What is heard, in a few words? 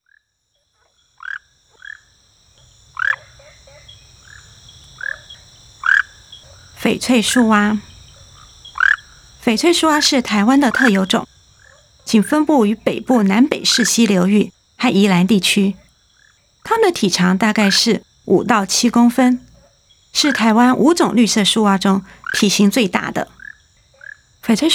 Rhacophorus smaragdinus